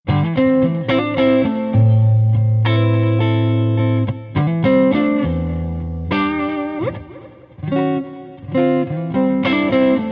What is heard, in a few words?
doppler, clean blues, delay, verb; digirack mod delay ii d verb; doppler wcrs type; stiff little stiffy dirt head 50